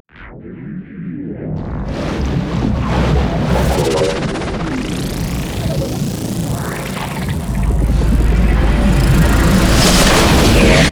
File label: recall windup; LoL; velkoz